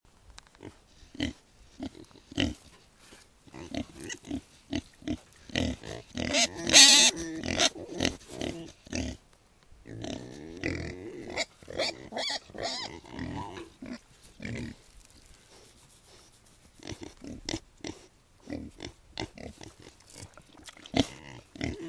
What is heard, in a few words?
pigs at farm; hapsford farm